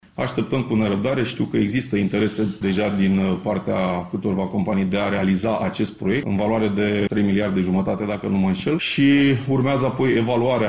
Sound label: drum